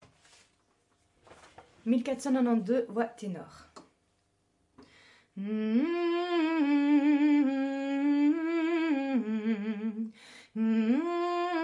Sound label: Tenor